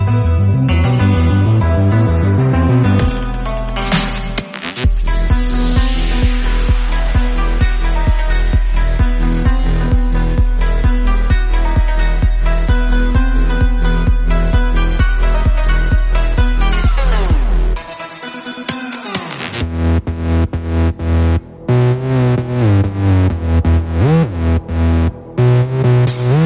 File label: theme instrumental; jujutsu kaisen; gojo satoru hollow purple